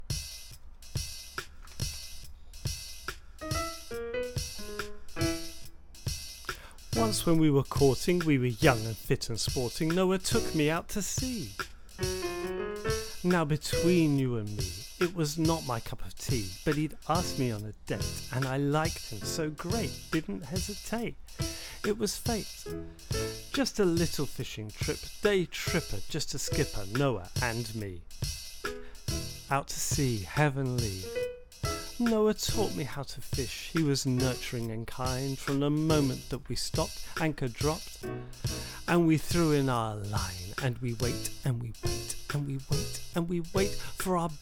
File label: vocal middle section slower